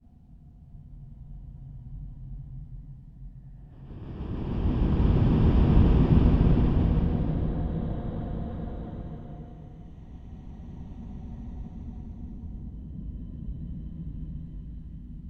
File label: Horror Whoosh